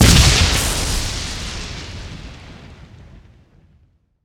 Lightning Bolt cast

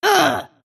attack small; Dota 2; snapfire

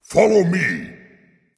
Dragon; Smite; Fafnir

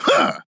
Grunt Jump; Buck

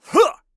Assassin Grunt Jump; Six-Shot Cyborg; Realm Royale

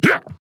Grunt Jump; Drogoz

